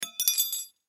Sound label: Silverware Drop Fall Spoon Knife Fork; Household; Dishware; Blastwave